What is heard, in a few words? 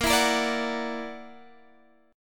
Gb Augmented 7th Bb